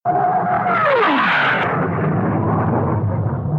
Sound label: airplane